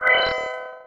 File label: levelup